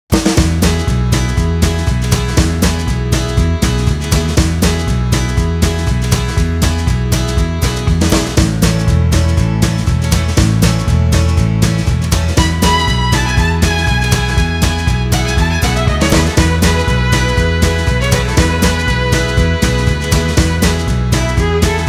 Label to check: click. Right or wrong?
Wrong